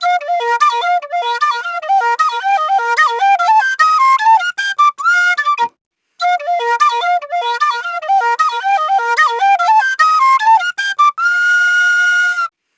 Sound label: Penny 075bpm Bb, Penny Whistle, African; Quazibeat; African Secret